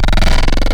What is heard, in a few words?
Trilobyte, Spectral; Xfer Records Serum; Serum Presets